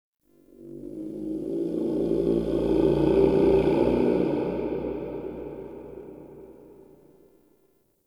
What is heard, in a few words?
Ext Creature Distant Low; Misc Outdoor